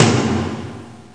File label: TOM; Descent II